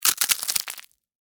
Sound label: Texture, Crack, Crunch, Ice, Cream, Cone; Food; Household